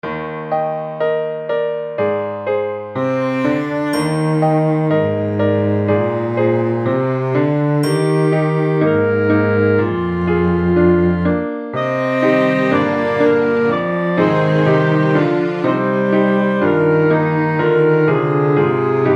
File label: relaxing